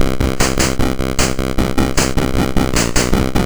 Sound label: sid MOS6581R2; California Games